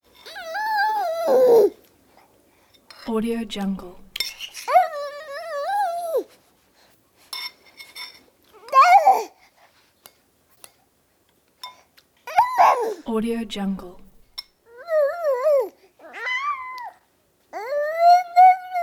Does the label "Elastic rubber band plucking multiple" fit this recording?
No